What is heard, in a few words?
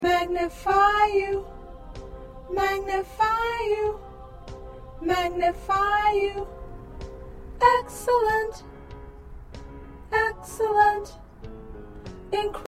1st Alto